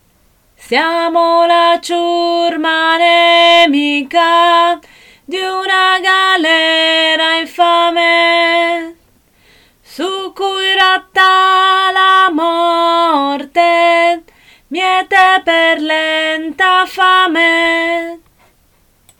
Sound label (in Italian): soprani